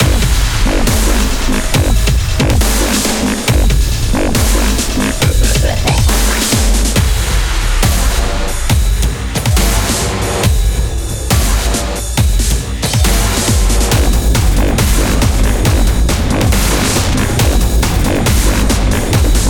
Battle Metro; let it die

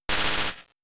Thunder Wave

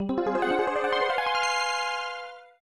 Unknown Fanfare; Panic in Nakayoshi World; SNES

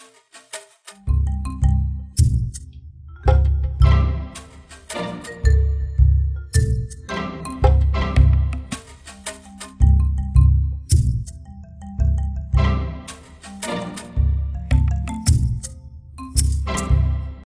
Jungle Level

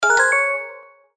Push; pucca n friends ios

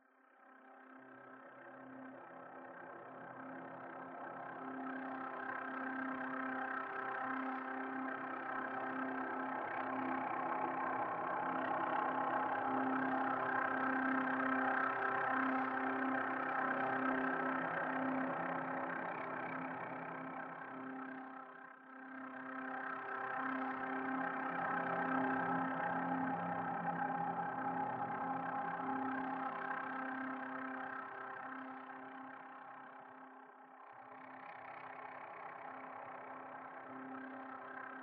Vibro Sample; The Sinking City

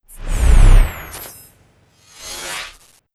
Main Menu Outro; Metroid Prime 2 Echoes

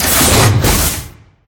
Door; Angry Birds Star Wars 2; Misc Resultscreen Door

